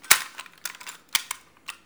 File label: Gun Handling Weapon, Weapons Foley; SFX Kit